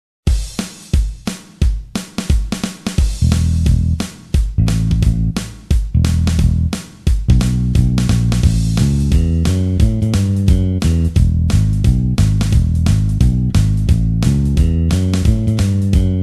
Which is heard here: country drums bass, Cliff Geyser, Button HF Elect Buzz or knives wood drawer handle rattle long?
country drums bass